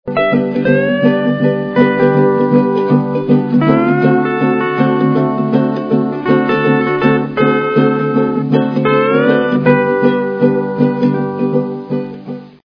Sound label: island music